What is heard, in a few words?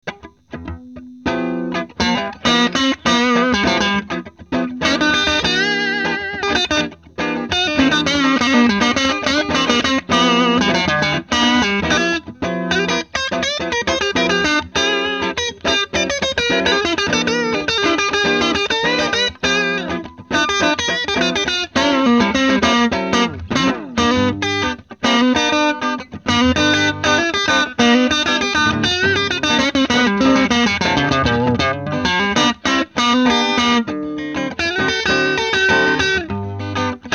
Sol High